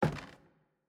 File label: Footstep Basement Wood Stairs